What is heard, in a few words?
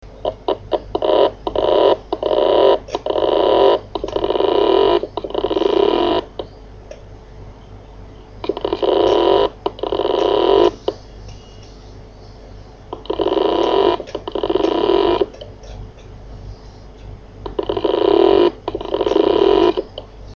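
Toshiba MK6025GAS seized bearing, hard drive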